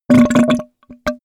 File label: toilet bowl fart wet; koerpergeraeusche; menschen; soundpool